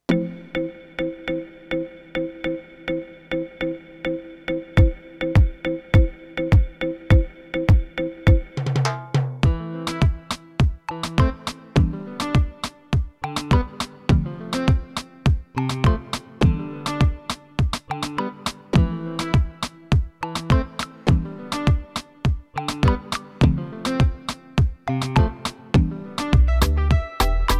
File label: Korg EK 50 L Style Smoke